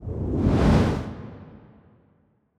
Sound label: kaiju juvenile tail swish long; Stellaris; biogenesis; ships